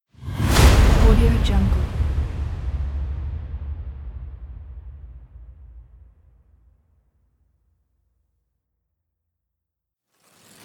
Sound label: balanced wooshes